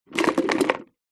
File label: cones plastic several knock over concrete; zapsplat